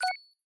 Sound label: Menu button; Thunder Fighter